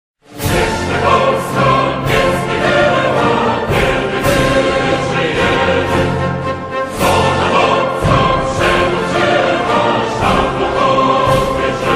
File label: National Anthem Poland, 1 verse; polmuz festival